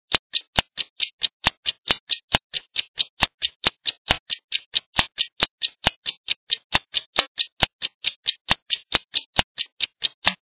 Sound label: tictoc